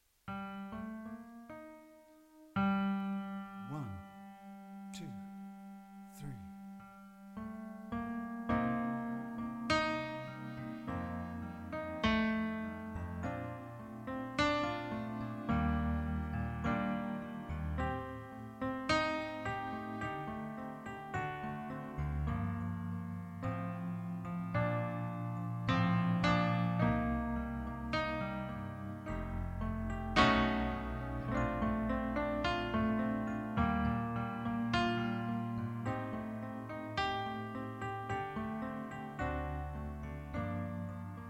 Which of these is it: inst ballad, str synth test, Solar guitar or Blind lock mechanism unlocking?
inst ballad